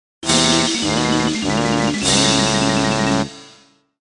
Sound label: Time Trial Failure; Banjo Tooie